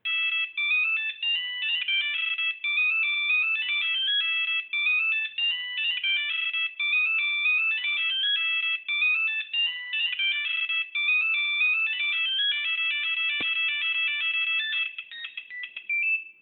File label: nokia 1100 ringtone; raptrap